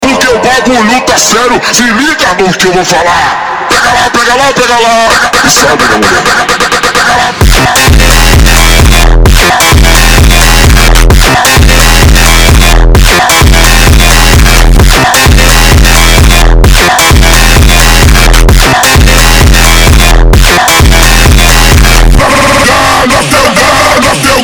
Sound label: Montagem